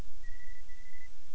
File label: two note trill, night parrot